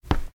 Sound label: Footstep Tile Right; thelandofwildblocs